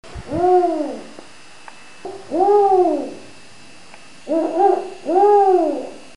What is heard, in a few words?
bubo africanus